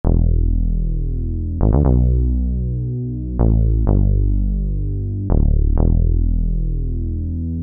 Bass; Adieu

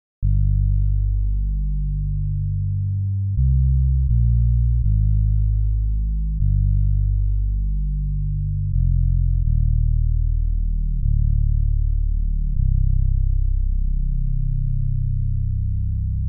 Bass; PatchFactory

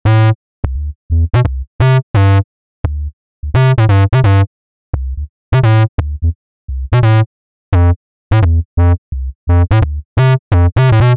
Bass; PatchFactory

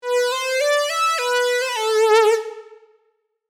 Lead

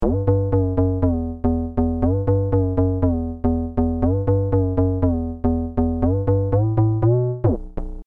Bass

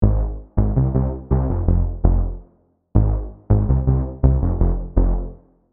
Bass; After School

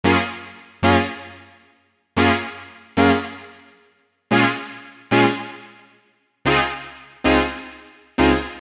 Chords; PatchFactory

